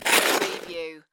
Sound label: Snow and Ice, Ice slide pass by